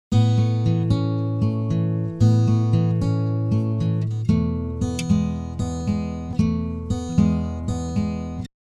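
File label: acoustic guitar on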